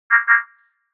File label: sound Wrong Answer